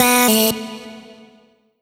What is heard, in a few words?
Vocal one shot A; Slate Digital Omnivox Vocal Sample Pack